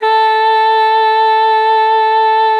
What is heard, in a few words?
A4, ALTOSAX; Xsample VOL-2; 90 s Sample CDs